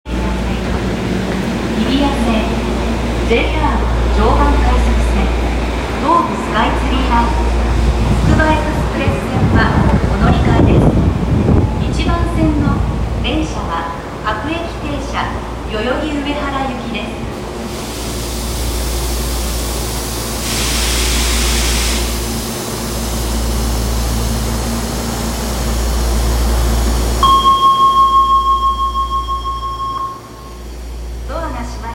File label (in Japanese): 千代田線北千住南